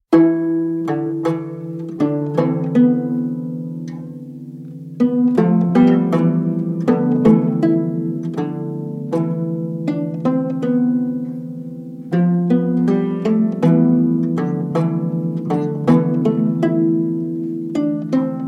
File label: pluck bow blow pluck